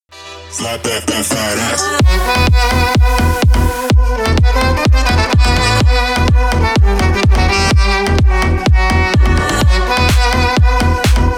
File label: slap sax, edm